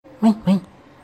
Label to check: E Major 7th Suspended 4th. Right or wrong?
Wrong